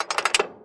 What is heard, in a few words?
daVinci Exclamation